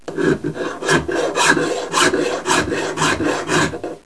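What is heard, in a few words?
filing, construction; KABOOM! - Wild and Wacky Sound Software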